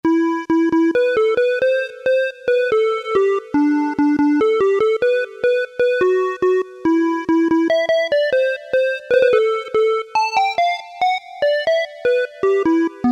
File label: SYNTH REED, Casio Rapman; Tones